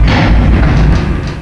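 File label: elevator stop; XDM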